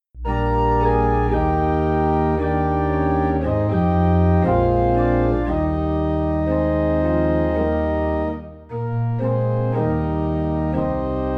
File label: Organ in E Flat